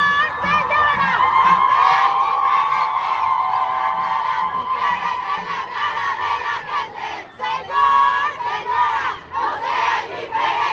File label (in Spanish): Cantos feministas